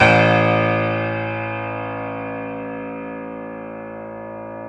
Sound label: PNO Grand Pno; Club-50 - Foundations Roland